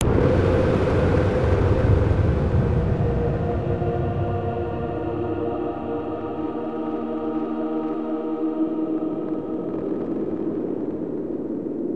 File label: hymn